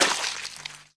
Barbarian Squish; Warzone

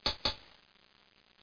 load; Bible Fun Pack; BIBLELND

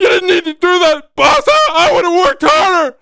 get shot; Messiah

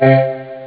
hoo, speech; OctaMED SoundStudio V1

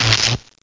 WALL

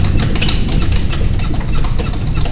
ELEV LOOP; REDNECK; RAMPAGER

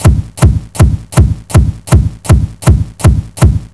walk; HangSim; beach

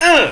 die; Exile II - Crystal Souls

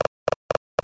stampedes; Bible Fun Pack; Noah

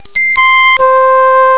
FLUTE; Amiga-CD 1996 #5-6; sampler; Instruments

